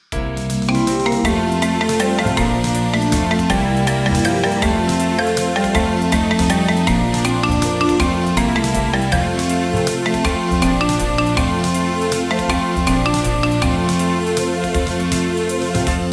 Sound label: ALARM; Sexy Games 3; birthday; Cal Data